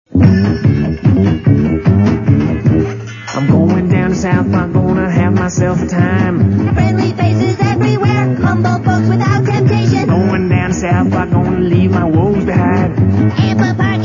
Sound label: Intro music